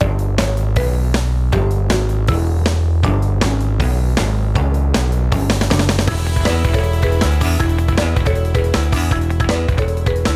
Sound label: Lose Race; crash team racing nitro fueled gamerip